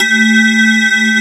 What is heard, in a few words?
METAL BAZZ